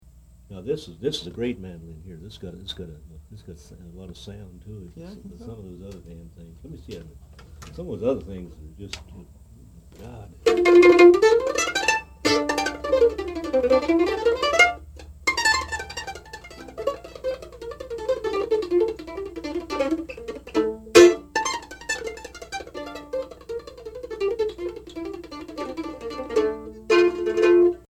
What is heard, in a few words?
This is a Great Mandolin